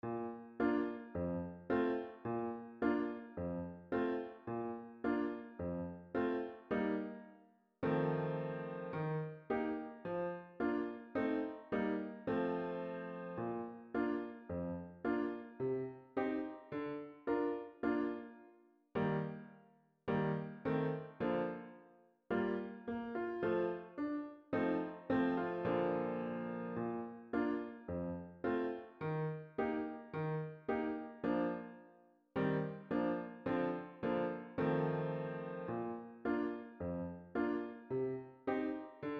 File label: dance song accomp without solo